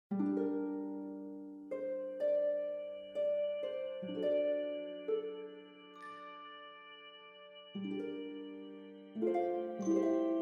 Scene Forest, music

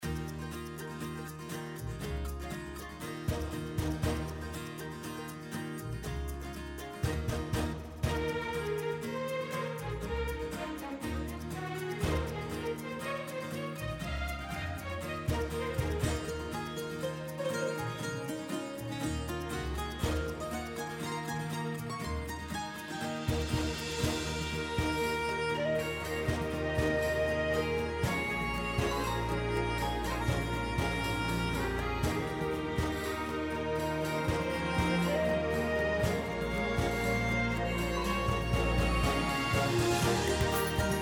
Battle Arena, music

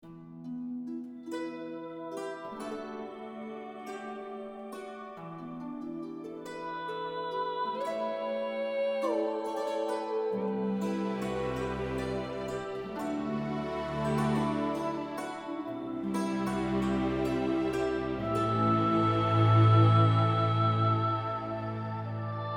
Scene Ancient Elf

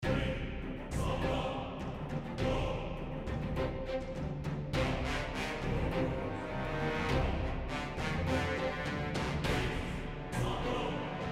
Scene Military, music

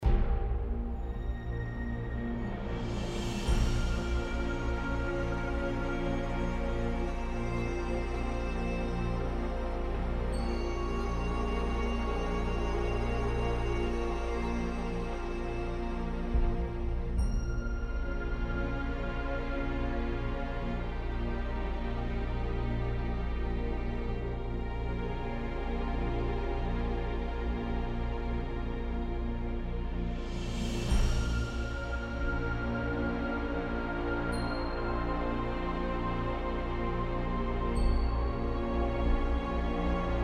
Scene SnowBerg, music

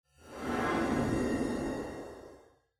UI Get Buff

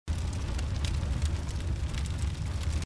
UI Fire

